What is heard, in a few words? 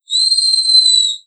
thrush